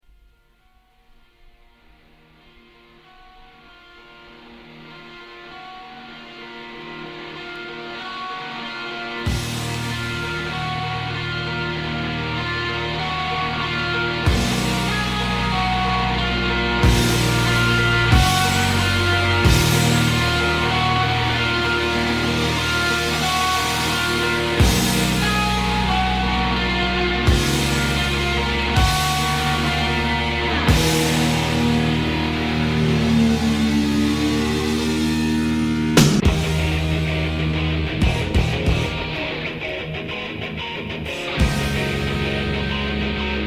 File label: Blow Siren